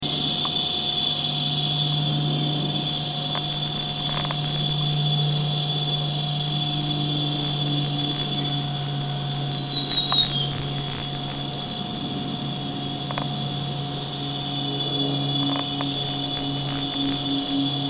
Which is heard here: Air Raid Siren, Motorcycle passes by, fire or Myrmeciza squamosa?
Myrmeciza squamosa